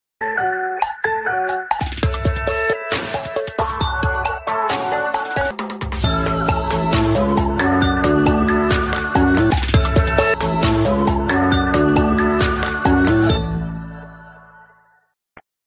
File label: cricket wireless motorola ringtone